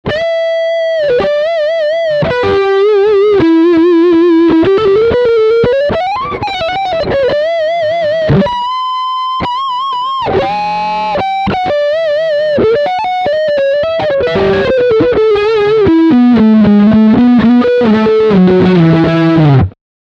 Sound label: Lick Front pickup